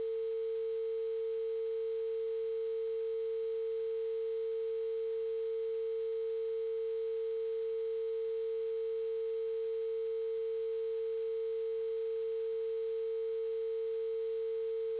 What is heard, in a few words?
dial